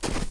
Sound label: Enemy Tentopad run